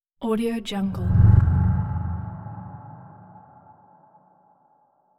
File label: deep reverse with no tail; hollywoodedge